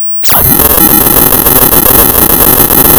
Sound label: Sound of tearing paper, center